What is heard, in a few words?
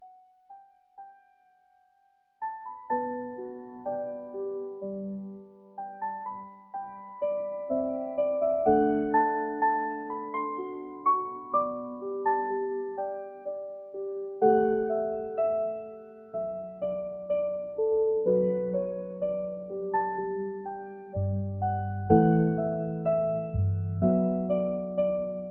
piano calm